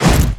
Creature Melee Physical; Kilta

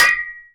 Hit Golfball Metal; Misc Sports

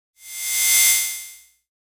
computer bleep; NCH Library

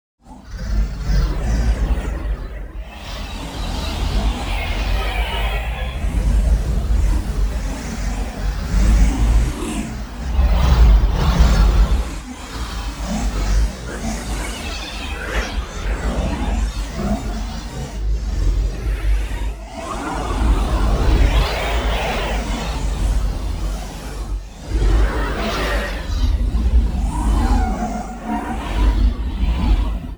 MOVEMENT Inside A Wormhole; NEON; boom library

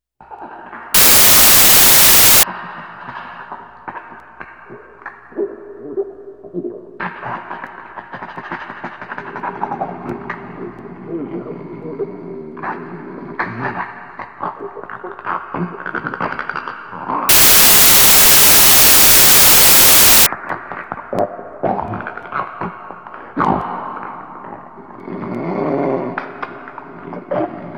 Scary Sounds Effects Creaking And Screams; 1001 Sound Effects; Halloween Scary Music and Sound Effects

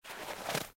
Snow Pile; Blind Quest - The Frost Demon

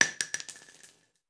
Bullet Shell; Sound Ideas; Guns

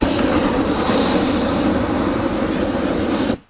Flame Thrower, Light Burst; gun and weapon sounds; captain audio; fully loaded

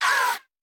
Bullet Pass By; Sound Ideas; Guns

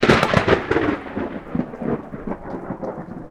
thunder full; Apotheorasis

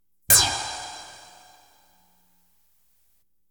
Science Fiction effects, Lasers, Light beams

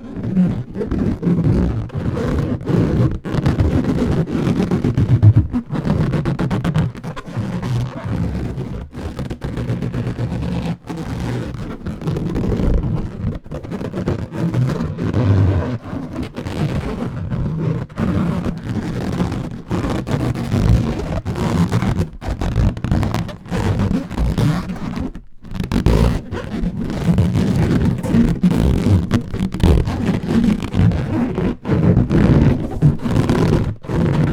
balloon rubbing; noisemakers; NS Studios - ultra sound collection

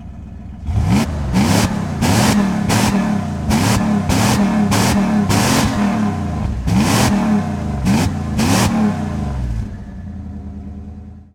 Truck Dakota Revs; Trucks

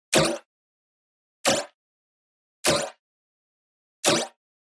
Generic Click Digital

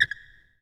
Basketball Squeak

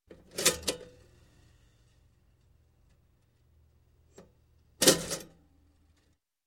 Toaster Push Down And Pop Up; Sound Ideas 2000 Series

